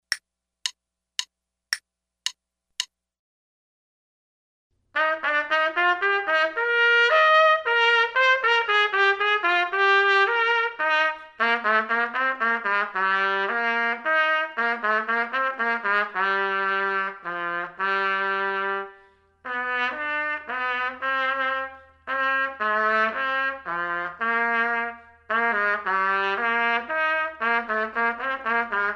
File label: trumpet; Selected Duets for Trumpet Podcast